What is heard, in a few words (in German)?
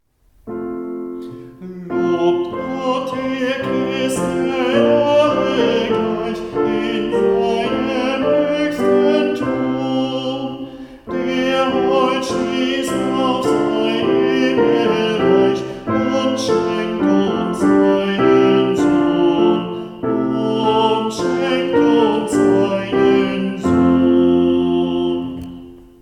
Sopran; Lobt Gott